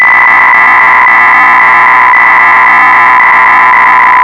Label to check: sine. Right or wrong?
Right